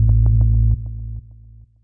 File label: Sub, drum